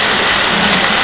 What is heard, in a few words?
Unknown sp Sound